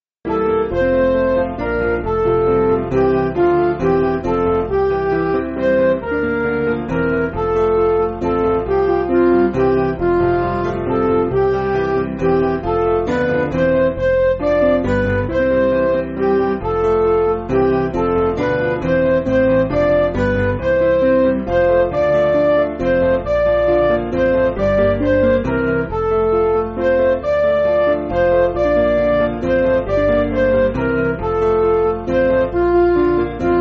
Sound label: Midi